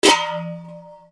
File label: HOUSE